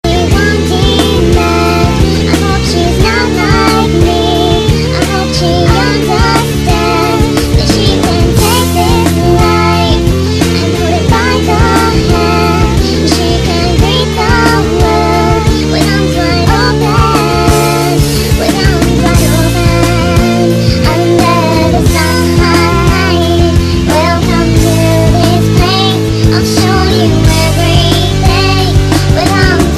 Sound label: Chimes